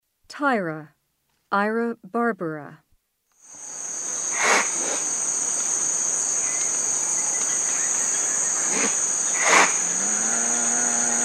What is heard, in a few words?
Tayra, Mammals of the Rainforest, S. America